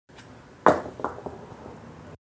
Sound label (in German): Pflastersteine schmeißen